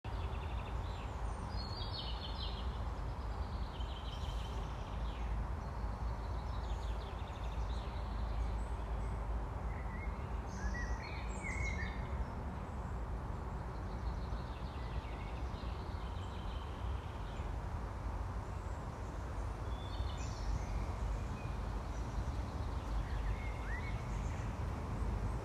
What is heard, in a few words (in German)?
Voegel im Tiergarten